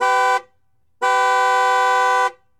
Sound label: Truck Explorer Horn; Trucks